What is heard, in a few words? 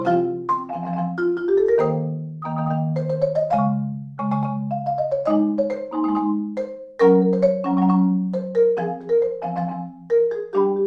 marimba; legend of zelda